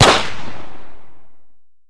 smg loop, 9x18mm; Caliber

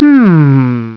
HUMM; BattleSNDS

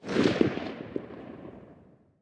echo town

